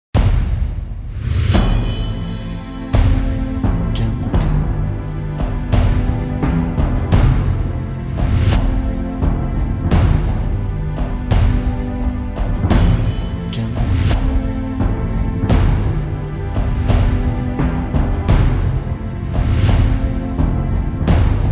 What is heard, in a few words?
Cinematic Drums Trailer